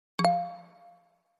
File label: marimba notifi lucky